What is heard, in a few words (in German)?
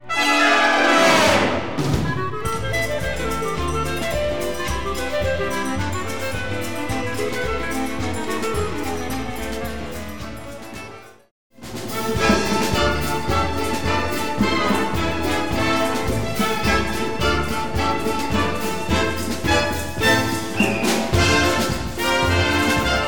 Samba Fugata Schnelle Samba